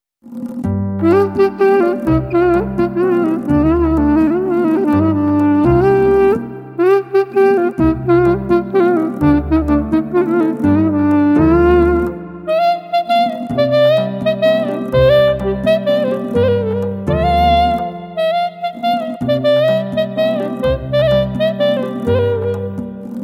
Ney Tar

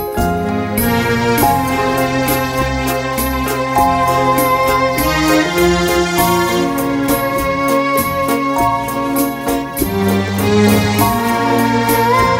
Violin

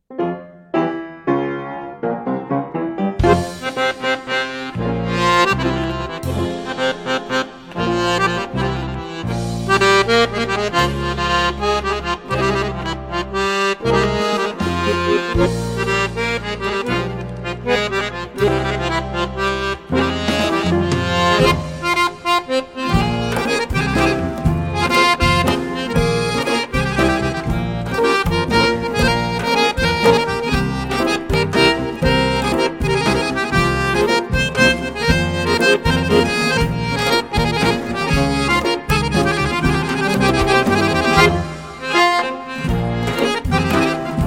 Accordion

